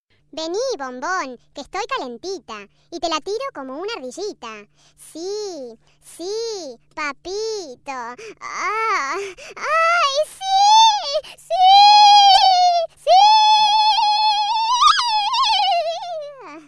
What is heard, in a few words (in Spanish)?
ardillita caliente; Pets And Animals Ringtones